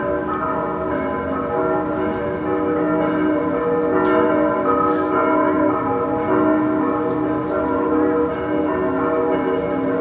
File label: Bells Distant Berlin; Sound Scapes